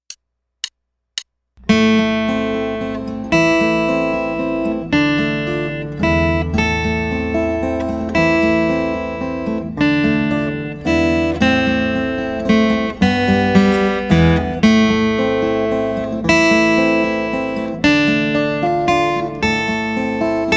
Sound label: Minor Melody